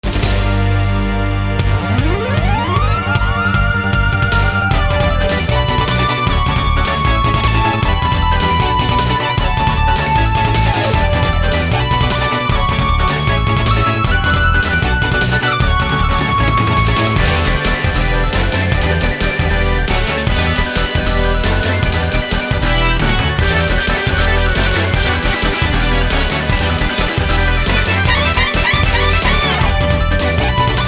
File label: Battle Cry; Steam Hearts